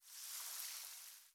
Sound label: Platform Dirt Fall